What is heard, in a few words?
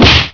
thwap